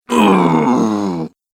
Growling Male Strength, Recorded Voice Sound Effects